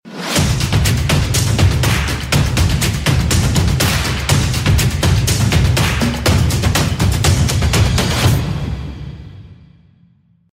intro music

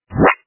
splat

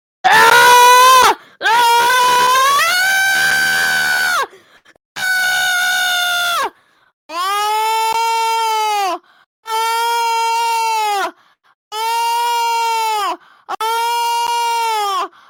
kid screaming

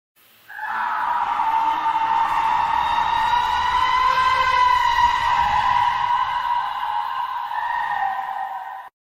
scream; goatman

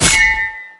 large blade ring

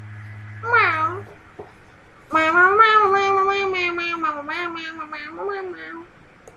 meow

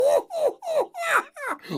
gnome laugh

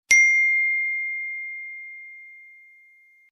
phone notification

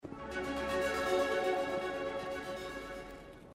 level up; mass effect

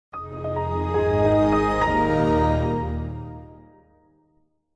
microsoft windows xp startup sound

